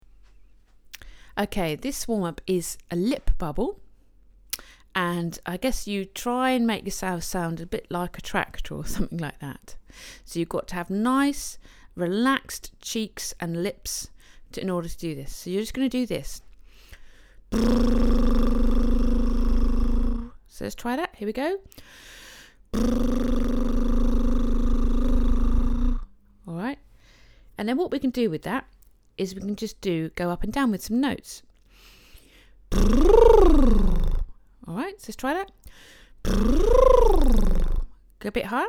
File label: Warm ups Lip Bubble